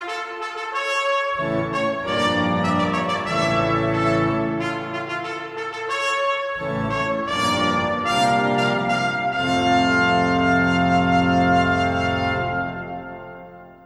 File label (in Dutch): instrumentaal Trompet; Intrada - Zingt nu de Heer